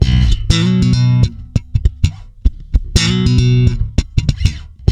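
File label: B, funk swing, bass; Spectrasonics; Bass Legends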